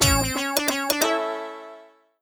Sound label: free Fall Intro; netent